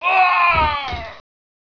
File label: bandit die violent; gearbox